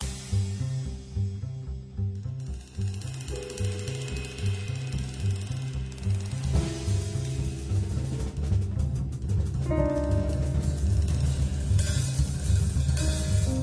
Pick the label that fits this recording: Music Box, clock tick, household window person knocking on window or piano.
Music Box